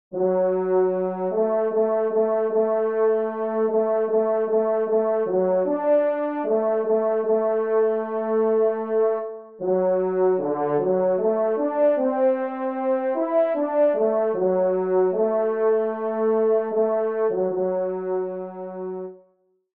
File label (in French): romance, trompe; Loisirs du chasseur 11